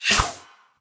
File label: Throw; Rebel